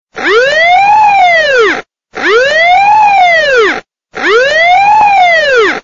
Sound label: Iphone4 Siren Glitch